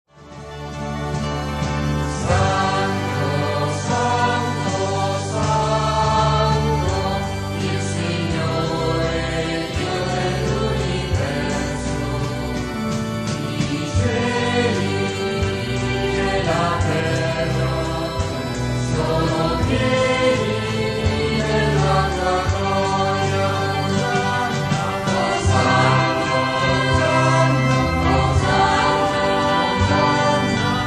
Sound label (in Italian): santo coro a 4 voci; bruno belli